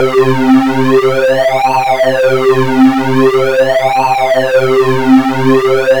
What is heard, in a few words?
square sync lfo uni; Hardsync; modulacii pitchu cez LFO; unisona